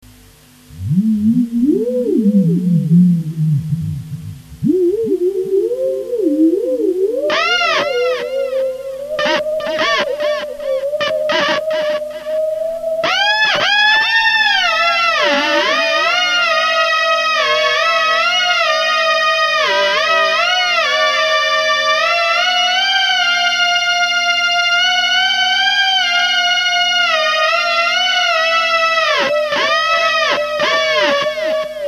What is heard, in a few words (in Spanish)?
nuevo con delay, Theremin